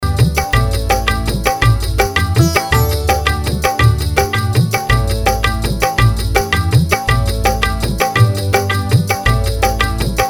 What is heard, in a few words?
Karaoke Song